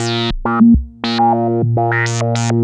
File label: HVCF A#2; CMP - Analogon; SH-VCF